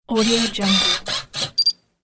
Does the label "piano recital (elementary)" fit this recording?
No